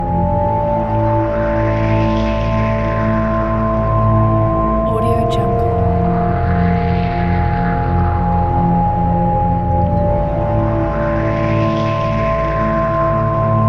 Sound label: eerie ambient scifi loop